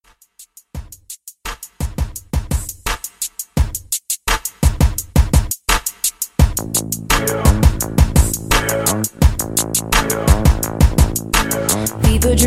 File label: Top40 ReDrum; Remixfreakz